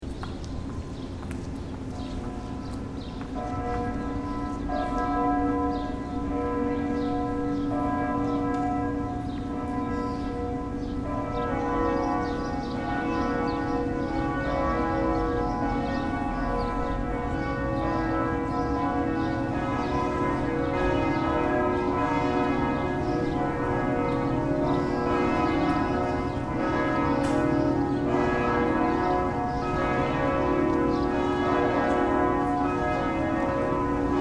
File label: Holy Trinity Bells and Notre dame de Quebec bells